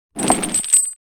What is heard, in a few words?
Lifting metal chains off floor pickup